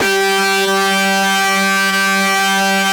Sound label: ARP FUZZBOX; Sonic Reality; Vintage Timetraveler